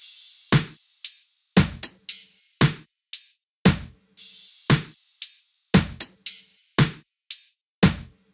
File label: Top Drum Loop 115 BPM; Drums; Top Loops; Humble Hip Hop Sample Pack